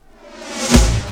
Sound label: FX HIT, Techno Trance; Ueberschall